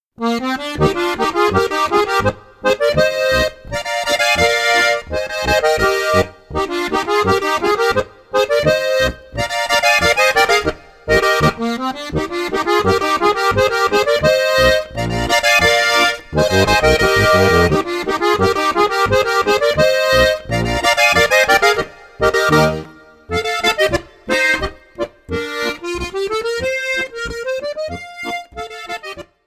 Boarischer

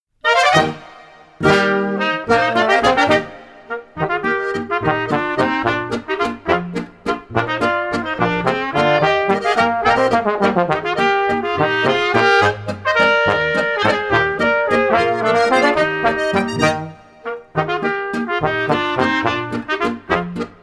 Schnaggl Landler

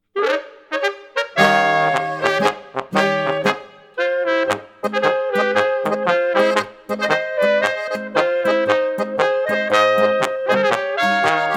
Marsch

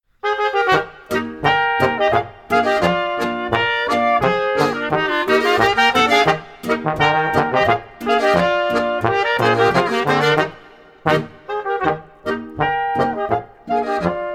Herbst Boarischer

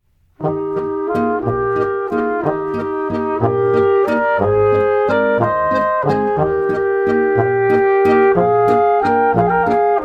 Salzburger Hochzeitswalzer

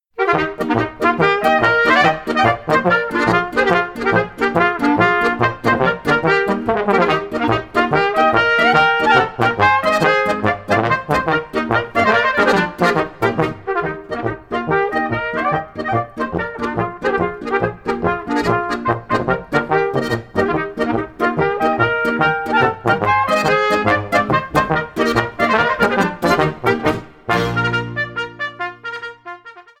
Polka

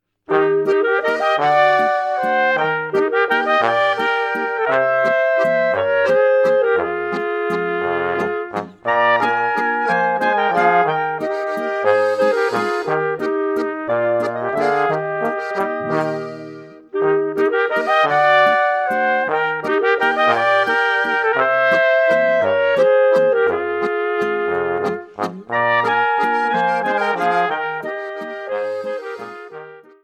Mimi Walzer

